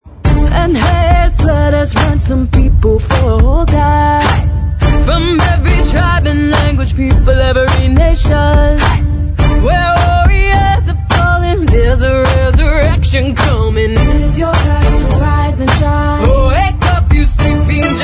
Tribal Heartbeat

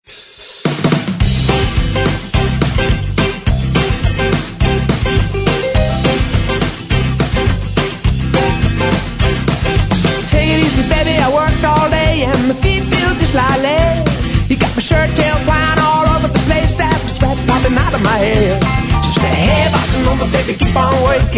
Bossa Nova Twist

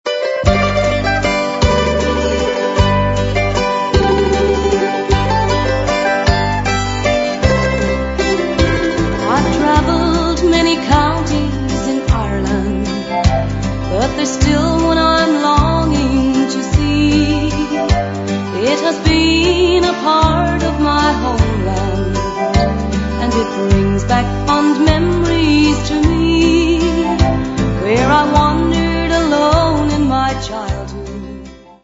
An Irish Waltz

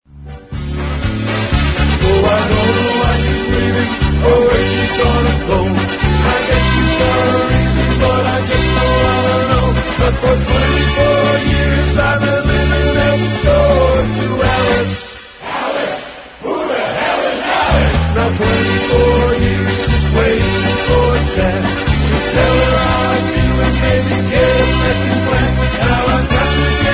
Continental Polka

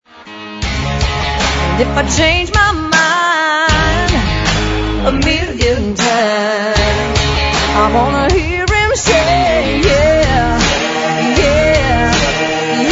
Canadian Stomp

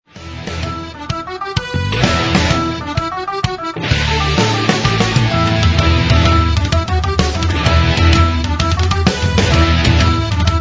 Irish Rock

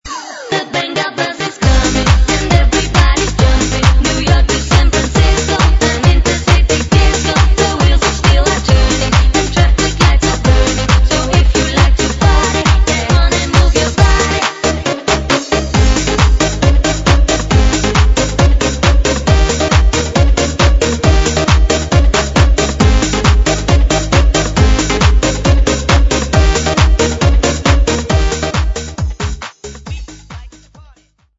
Shuffle

